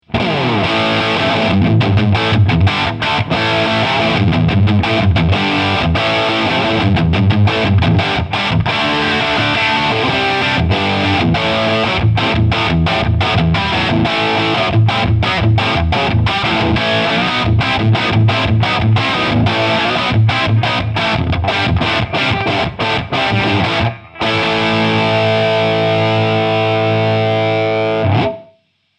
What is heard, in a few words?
Gibson LP 50s Bridge Pickup Lead